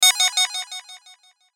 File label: Hit Digital Beep Delay; Blastwave